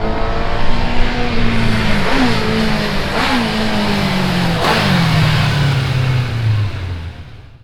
Speed Bike Rev By, Motorcyles; Vehicles

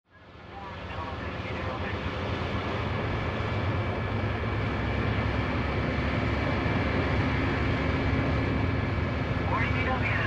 Cockpit Ambience And Take Off

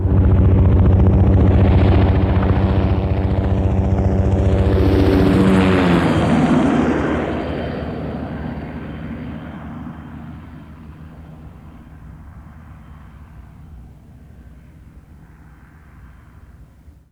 Military Helicopter Fast By; Vehicles; Aircraft

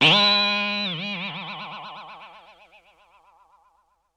Boing Box; Vintage Cartoon; Hits & Tones

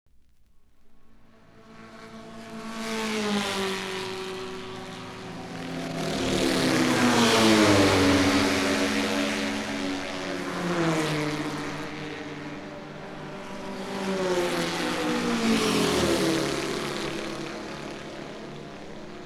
Race Cars

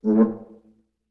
Viola String Finger Rub

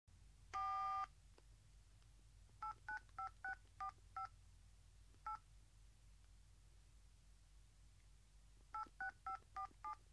Cell Phone

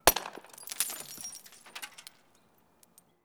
Bottle Break, impacts; Bottle Break 03

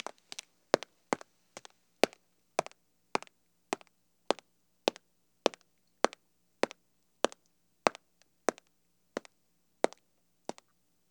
Water Drops On Canvas

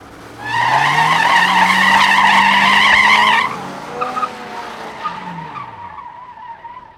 Skid And Peel Away; Vehicles

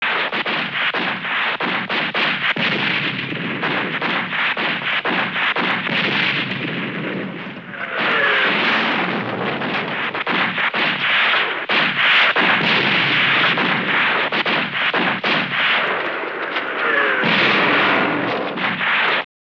WWII Battle

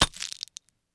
Camera Flash Bulb Crackle

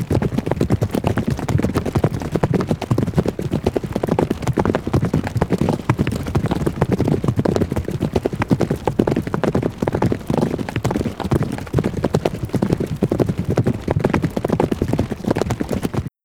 Horses Group Gallop

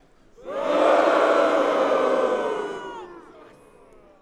Crowd Boo Strong

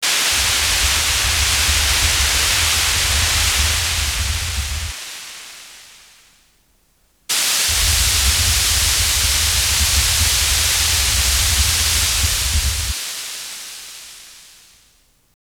Hot Air Ballon

